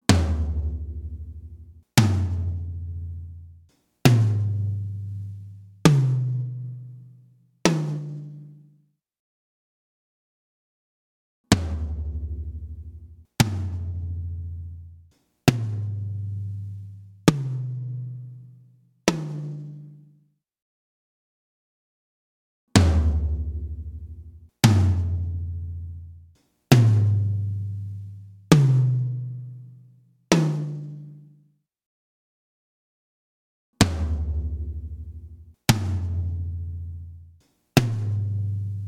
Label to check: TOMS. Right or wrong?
Right